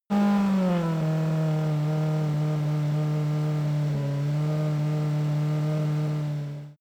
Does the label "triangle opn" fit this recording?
No